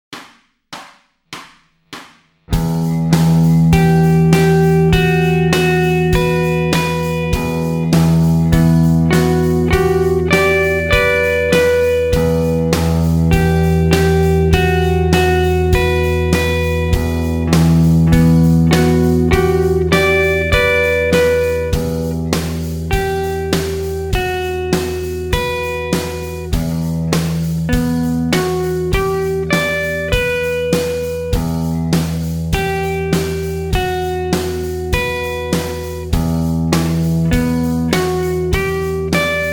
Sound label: Riff, guitar